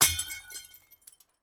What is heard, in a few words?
matter mayhem metal small object crash on ground; soundmorph